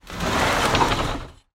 household greenhouse door slide open

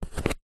office scissors pick up from cardboard sheet

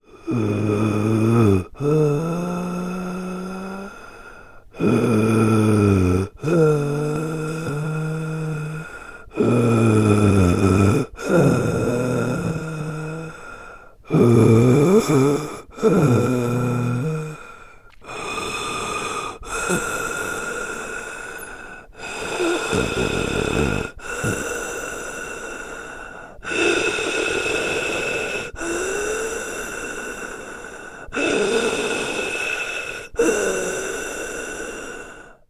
human male breathing sleeping could be dying

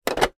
toy large plastic car put down on floor

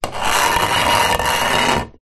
small metal pole scrape on tiled floor; foley

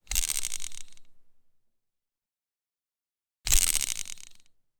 weapon gun smith wesson 642; still north media

